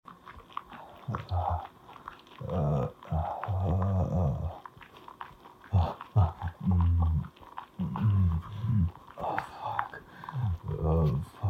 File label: Moans & Whimpers, Wet Sounds, Submissive; Moans & Whimpers For You~